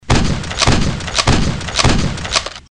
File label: Continuous Shot Of The Shotgun; Dude Theft War; Android